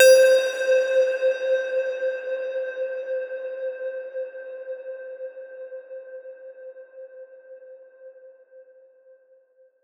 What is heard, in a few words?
Beep; 7rystan's 600+ FREE EDM SAMPLES & DRUMS; SFX; One shot